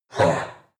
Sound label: demon dark voice thick groan; Creatures; kreaturen